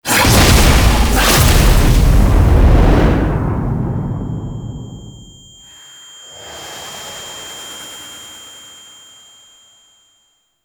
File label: Door Destroyed; Narmer Outpost End